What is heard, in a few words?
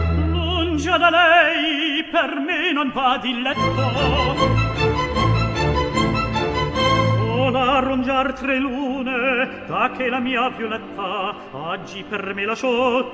Lungedalei, tenor